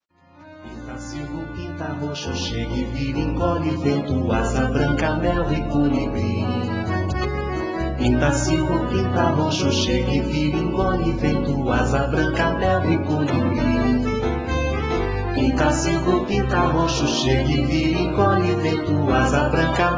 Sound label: vocalise